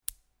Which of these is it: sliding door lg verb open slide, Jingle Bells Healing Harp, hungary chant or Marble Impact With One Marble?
Marble Impact With One Marble